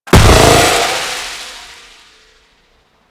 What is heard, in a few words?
Force Field Hit D; Warframe; Ambience; Tenno